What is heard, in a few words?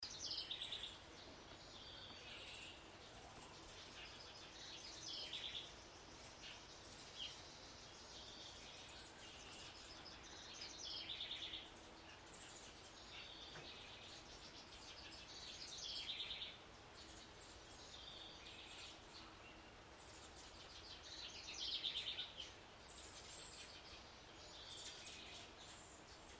stereo soundscape morning birdsong in my parents garden